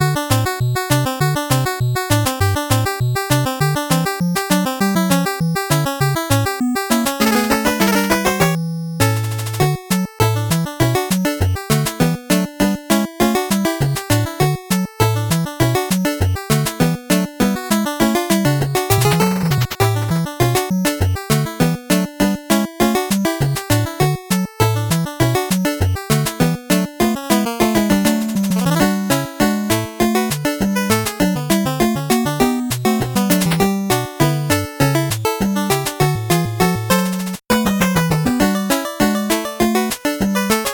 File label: 8bit bgm slow